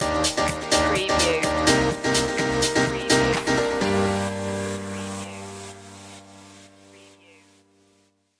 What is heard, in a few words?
summer clubbin stinger